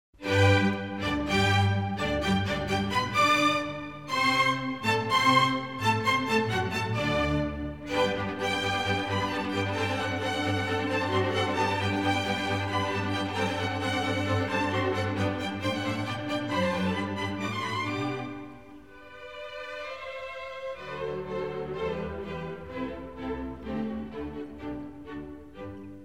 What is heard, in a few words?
Serenade No. 13 for strings in G; Wolfgang Amadeus Mozart; Mozart Greatest Hits